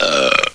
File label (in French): beurp; Barney rote (Barney)